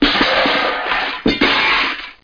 potpan

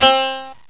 saz, arabic

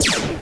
laser; Uprising

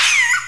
Player Death; BabySmash 5.4

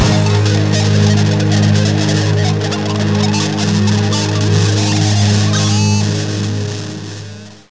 ROCK

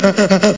grunts

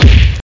vert b drum; The Epic Collection 3; Epic Marketing; muzak samples

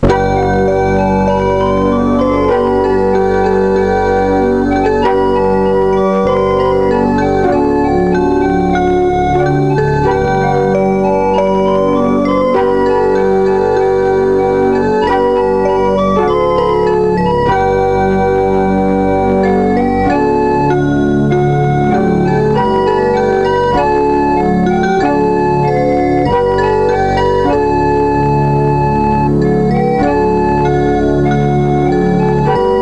musak; Treasure Hunt

